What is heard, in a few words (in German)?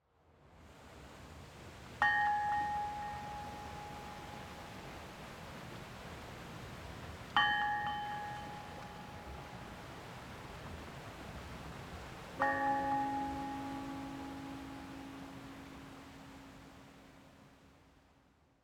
Eisenhüttenmuseum Wasser Glocke; Peitz